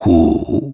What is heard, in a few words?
hooo